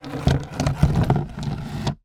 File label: foley stones pebbles movement tip in small cardboard box